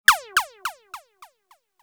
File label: congratulations, message tones